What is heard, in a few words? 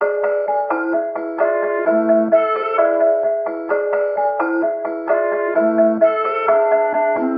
130 BPM A Min, Trap Melodies; Nightmares; Cymatics; Trap Starter Pack